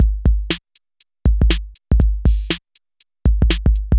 Synth Loop, BPM120

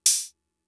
Open Hat, Drum Machines MFB-522; Fricke

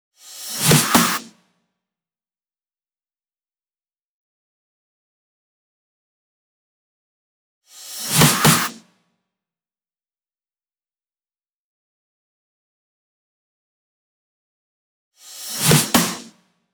128 BPM Fills, Drums & Perc; Vengeance - Essential Clubsounds Vol.5; Old College Kit Root G

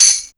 TAMBRIN, TAMBOURIN; Big Beat Samples & More; AKAI

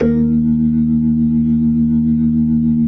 B-3 D#3, Jazz; Keyboards of The 60's and 70's

